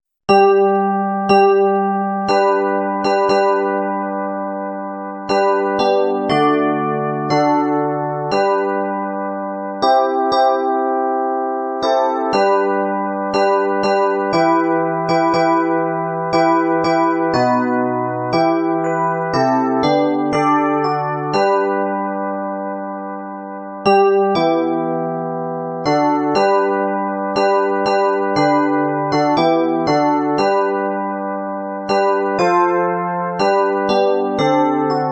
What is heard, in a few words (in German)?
G-Dur Electric Piano 2